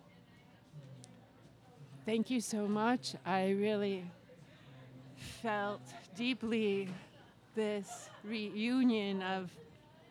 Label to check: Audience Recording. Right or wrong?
Right